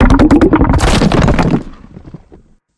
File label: Ability Rock Prison Tell; Evolve; Behemoth